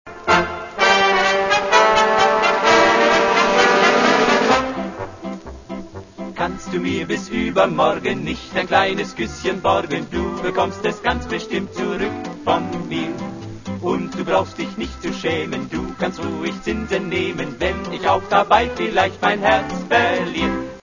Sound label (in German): Kuss Polka